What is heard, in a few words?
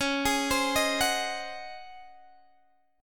C sharp Major 7th Suspended 2nd Suspended 4th C sharp, Guitar DADGAD; arpeggiated